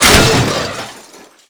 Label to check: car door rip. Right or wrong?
Right